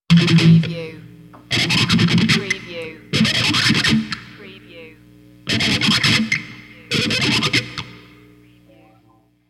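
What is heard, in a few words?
Distorted Guitar Scratches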